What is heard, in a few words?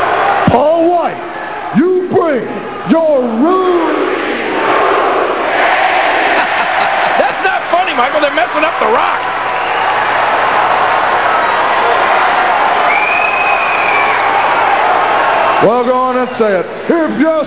rock